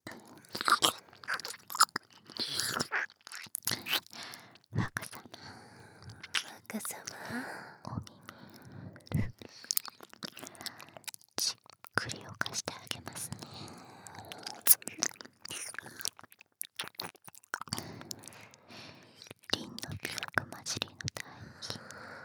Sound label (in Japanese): ダブル耳舐め（効果音なし 手コキなし 4点責め耳舐めのみ差分）